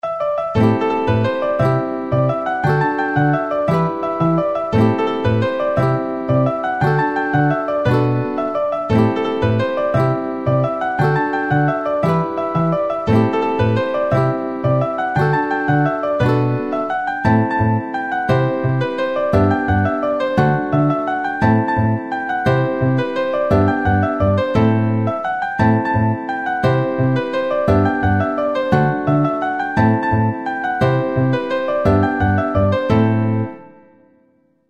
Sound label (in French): Farandole du Vivarais Tutti